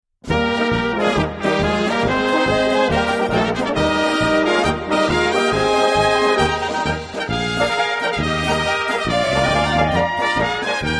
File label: Power Polka